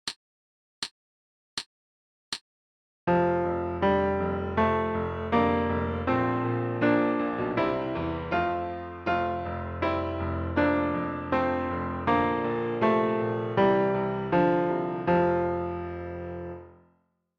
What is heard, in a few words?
F Concert Quarter Notes